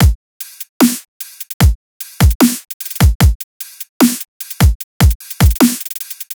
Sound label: Dubstep Drum Loop Full, 150bpm; Stem Seperated